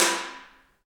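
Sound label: Kenyan Drum, African hand drum; Roland LCDP14 Africa VOL-2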